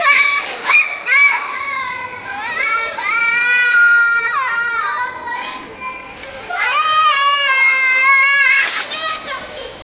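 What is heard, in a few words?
a child throwing a tantrum; baby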